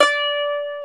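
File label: ethnic plucks, balalaika; Eth Plucks, Pluck Duo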